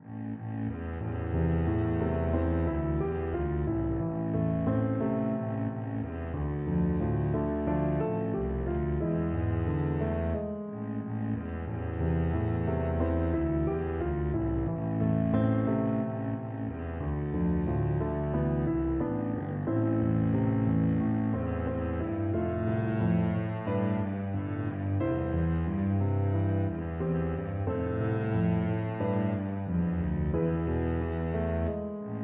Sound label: wayfaring stranger, double bass